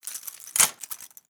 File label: place bullets; Rust